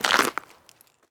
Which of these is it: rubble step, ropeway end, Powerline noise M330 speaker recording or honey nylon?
rubble step